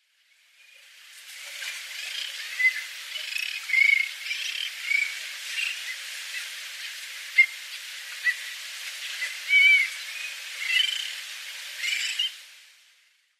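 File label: Bridled Tern, okinawa; mamijiroajisashi; koe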